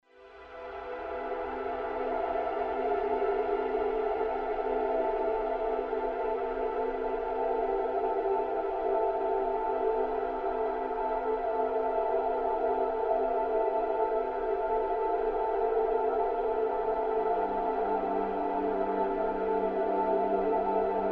Amb Sound Scape Dark, Mysterious chords, panning effects; Ambient SoundScapes